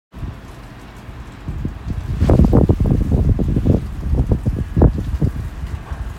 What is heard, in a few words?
more wind and rain